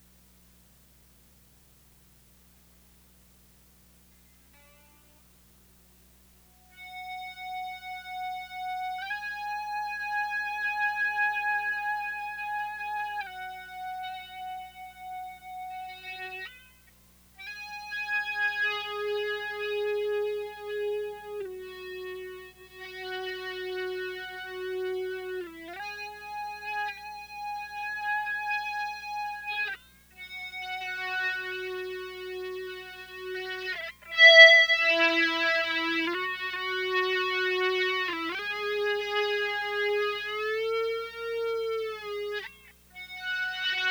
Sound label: guitar ebow